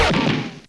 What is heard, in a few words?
chaingun impact; weapons; Tribes2